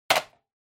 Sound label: Intercom Handset Replace Strong